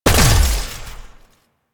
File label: Mech Jump; Nechro